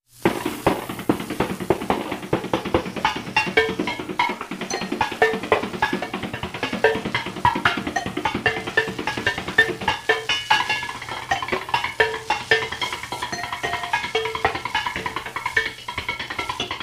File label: Washboard Rhythm; usa; appalachia